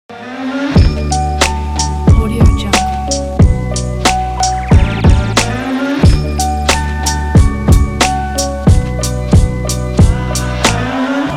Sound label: intro hip hop podcast